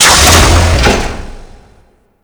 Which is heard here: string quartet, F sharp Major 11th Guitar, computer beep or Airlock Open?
Airlock Open